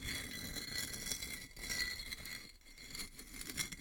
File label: Scrape light; Puddle